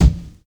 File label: loud bass drum b key, kick drum sample tuned to the B note. Loudest frequency: 313Hz